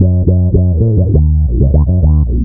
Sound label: WHA BASS; LOOPBASS KIT; AKAI; Big Beat Samples & More